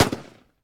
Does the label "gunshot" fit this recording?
Yes